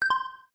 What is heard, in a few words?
Video Stop; Balmuda